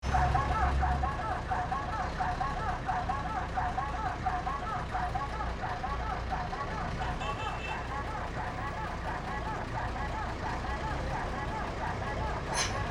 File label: truck warning sounds; Truck making automatic warning sounds; Beijing